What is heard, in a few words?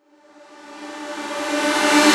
Reverse Synths D, Effects; Vengeance - Essential Clubsounds Vol.4